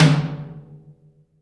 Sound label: Room Tom Hi, Room Drums; Akai MPC500